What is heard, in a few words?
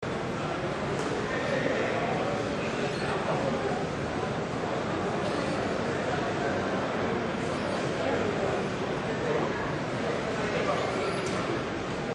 airport crowd